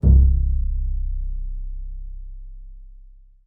C1, bass pizz; Xsample VOL-5

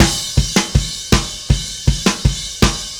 Happy Pub Beat Crash, Indie Rock; Sonic Foundry (Sony Creative Software); Indie Rock Anthems; Session 05 - Happy Pub

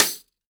jungle snare chromatic, real drums

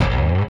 Rickenbacker Bass; Airwindows; Rick-Xtras

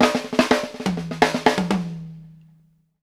SNARE, drumworks fills; Sampleheads; New York City Drumworks VOL-1; KD FILLS 120

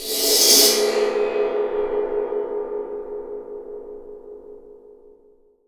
RECO RECO, Definitive Percussion

